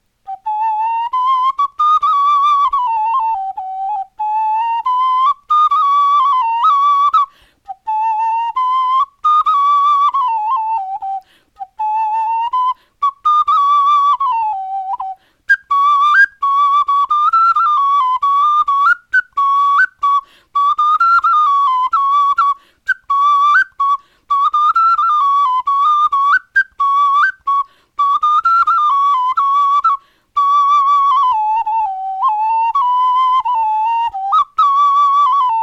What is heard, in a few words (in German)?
melodie